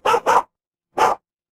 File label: animal dog bark